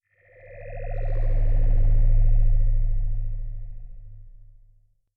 teleporter warmup

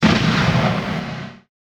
cannon Old; Frogatto

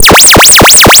sawtooth wave + phaser; Frogatto

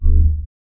Menu Click; Frogatto

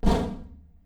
thwump left; stereo via phase of 0.004s

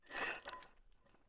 Chain Swing

